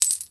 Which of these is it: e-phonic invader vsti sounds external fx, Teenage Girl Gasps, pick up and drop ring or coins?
coins